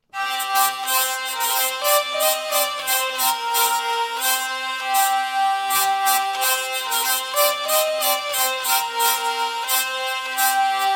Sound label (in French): Complainte Vielle; Psalmet